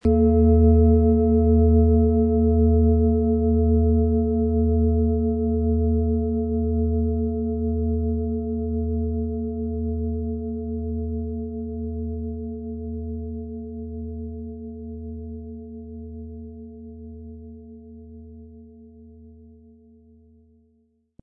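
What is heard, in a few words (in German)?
Planetenschale Alphawellen & Mond, Ø 16 cm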